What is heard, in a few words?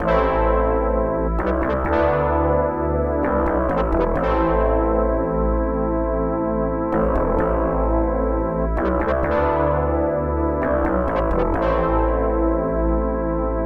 dark melody